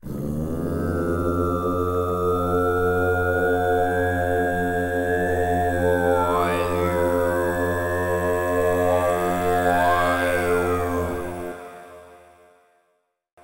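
Buddhist sounds singing male long multiple meditation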